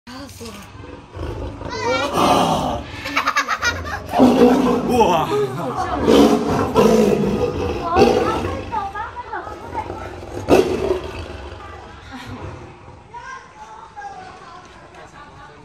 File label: Liger